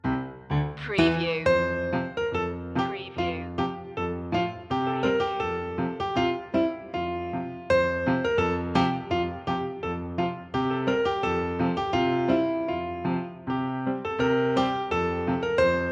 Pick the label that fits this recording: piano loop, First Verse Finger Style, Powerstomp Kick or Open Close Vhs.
piano loop